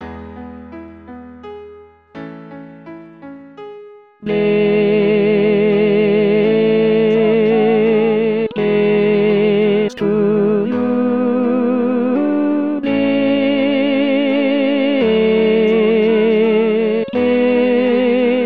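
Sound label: tenor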